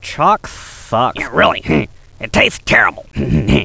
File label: chalk; "Chalk sucks, it tastes terrible"; Beavis